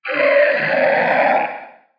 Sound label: raptor roar medium; soundscrate